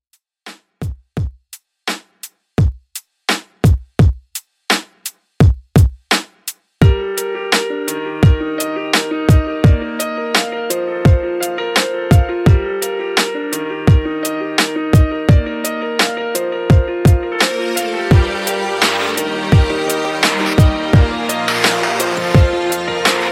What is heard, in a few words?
85 Bpm